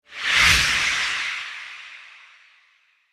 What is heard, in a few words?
cinema reveal